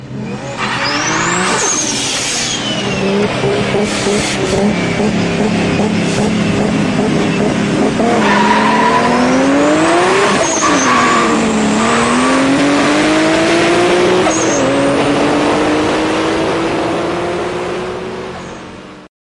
Turbo

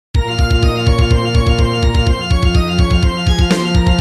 Nokia Outside Ring

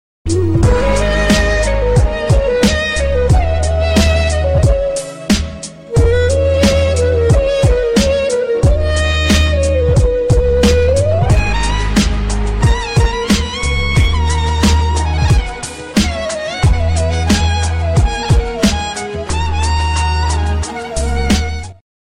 Sad Tune

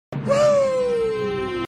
Woo; Ric Flair

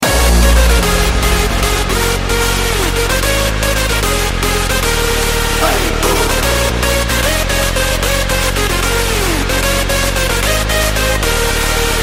bass modul

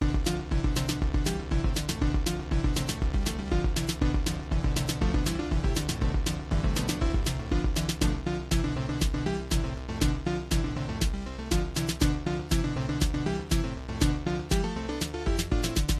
chiptune battle

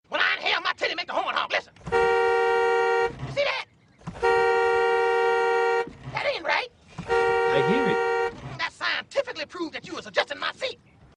Horn Honk

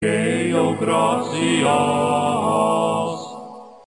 Chant; Warcraft 2

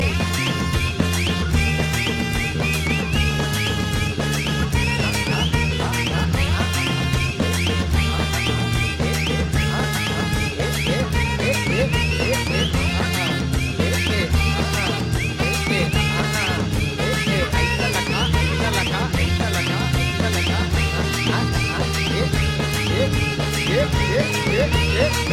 Trumpet

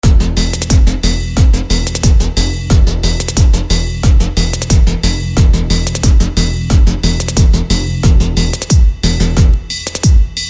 Bass Beat Looped

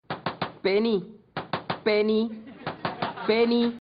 Toc Toc Toc

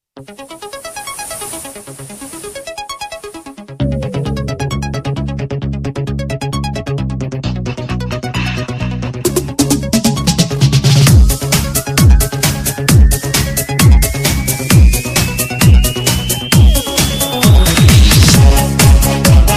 Refresh Ringtone

